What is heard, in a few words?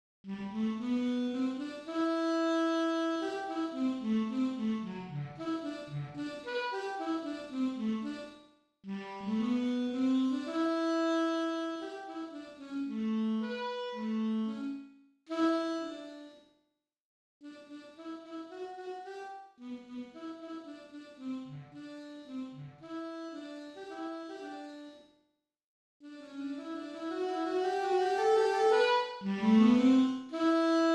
Alto Sax transposed; Band; UVM